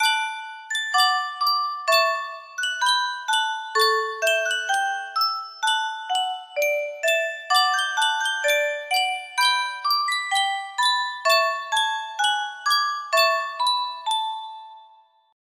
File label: yunsheng music box